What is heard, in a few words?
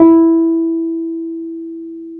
PNO, Wurly